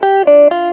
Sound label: TAR GEL, Roots Disco; USB Soundscan vol.32; AKAI